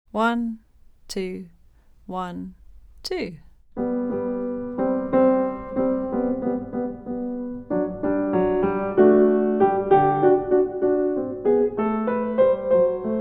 Sonata in G backing

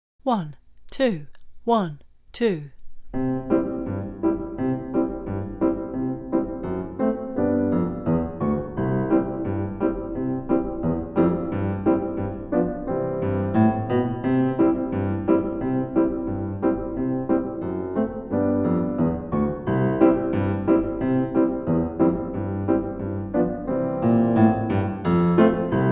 backing, C; Oh Susanna

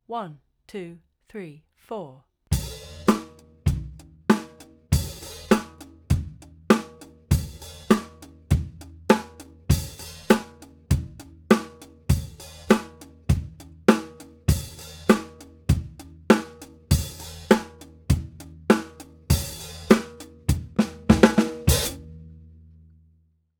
44 drum goove

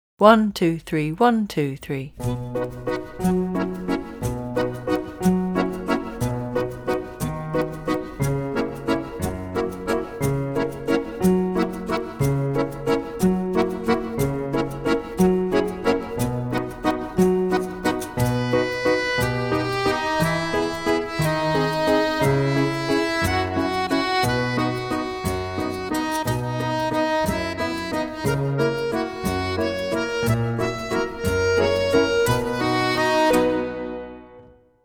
Cielito Lindo backing

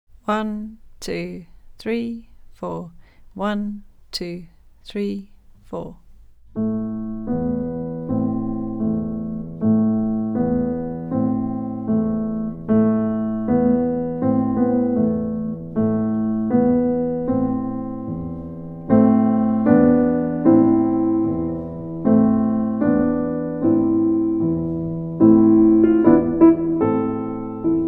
Frere Jaques Mahler backing

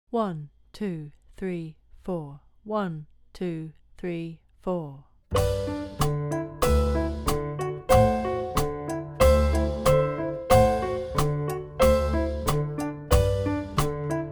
Cha cha cha arco

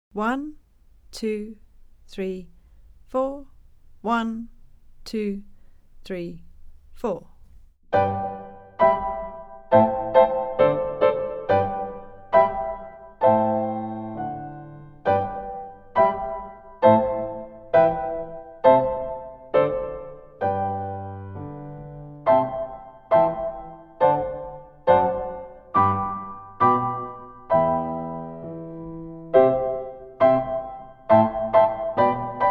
Pizzicato

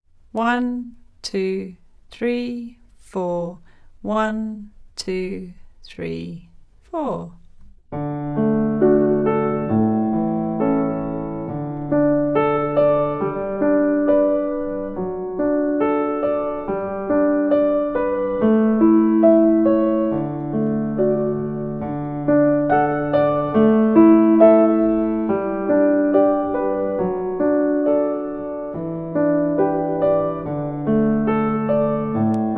D major scale backing slower

